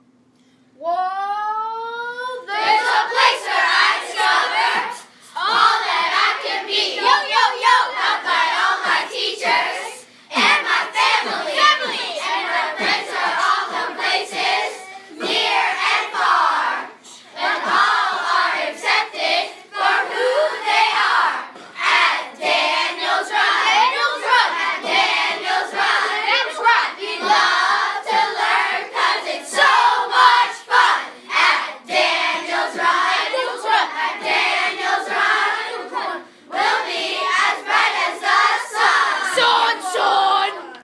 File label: rap